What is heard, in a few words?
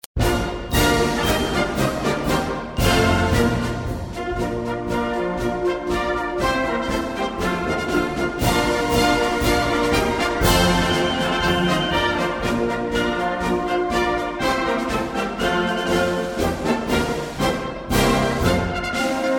Ukrainian Marsch; cccp